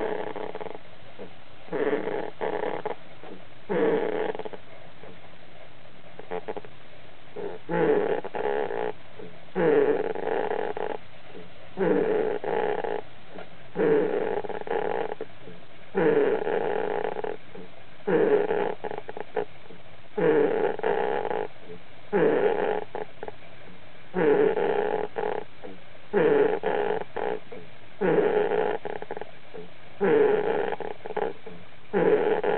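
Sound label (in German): schwere Lungenentzündung, Igel; krankheiten und verletzungen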